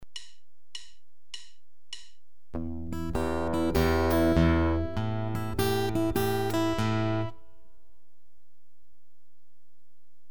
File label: Repeated motives at same and different pitches, guitar